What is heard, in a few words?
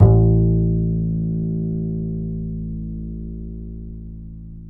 A 2, Bass; Spectrasonics; Bass Legends